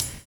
SERIAL BD; Univers Sons

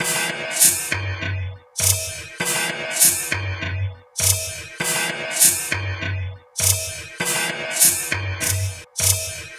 Knife Edge Beat, Electronic Beats; Sonic Foundry (Sony Creative Software); Myths of Technology

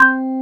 Korg CX3, C 1

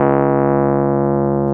PNO, Electrics, Rhodes

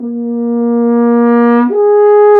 brass, F.Horn Solo; F.Horn FX; Intervals

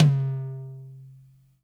MEDIUM TOM, ELECTRO KIT; DRUM N BASS:JUNGLE BEATS

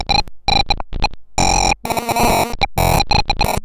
Destroyed Loop, 130BPM; musicradar; bitcrushed; Crushed Loops

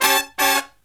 SLEEP, Killer Brass Riffs; USB Soundscan vol.29 - Killer Brass Riffs; AKAI

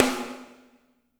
SNR; Spectrasonics; Burning Grooves

